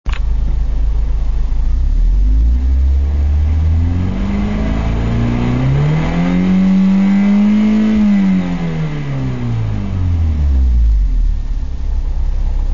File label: trd exhaust